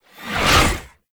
Impiety Riser; Warframe; Dagath

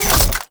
Door Medium Close; Ambience; Warframe; TheNewWar; Zariman

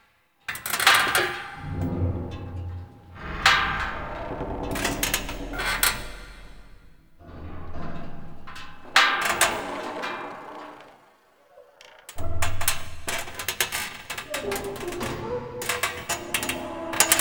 Metal Crate Creaks Loop; The New War; Ambience; Narmer Fortuna

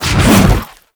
Overhead Long Smash Attack Whoosh; Enemies; Warframe; Grineer Nightwatch

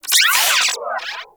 Digital Glitch; Ambience; Warframe; Corpus Railjack

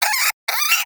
Cryptilex Vocal Alerted; Characters; Warframe; Hunting Critters

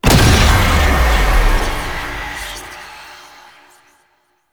Apparition Impact; Warframe; Dagath

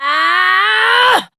Abil Exert Adult, en; Warframe; Duviri; Drifter; Melee

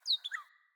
Cardinal Male Bird Double Chirp

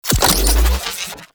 Rifle Reload B; Tenno; Gyre

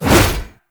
Staff Swing Sweetener; Warframe

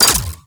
Flak Cannon Mech; Warframe; Railjack; RailJack Weapons

